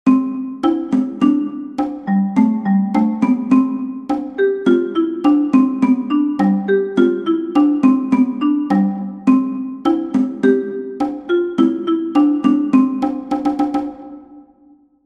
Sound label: Cajon; Trarira, der Sommer, der ist da